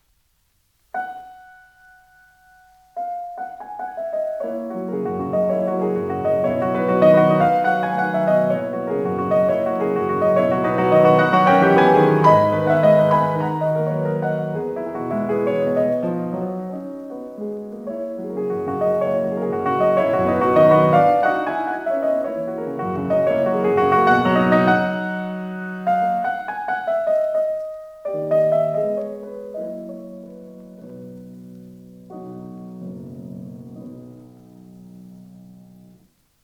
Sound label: Preludes; Chopin; Op28; Lympany